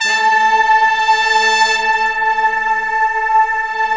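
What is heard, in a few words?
BRASS, Slow Brass Choir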